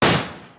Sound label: tire explode; car; Project Zomboid